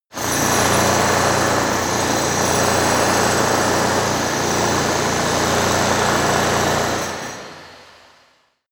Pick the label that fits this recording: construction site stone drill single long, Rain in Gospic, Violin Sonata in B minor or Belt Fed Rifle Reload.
construction site stone drill single long